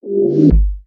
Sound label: Cockpit